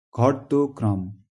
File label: descending